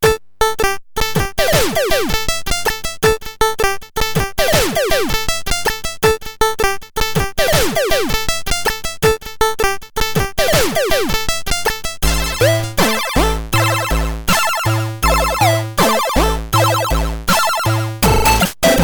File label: arcade samples and chord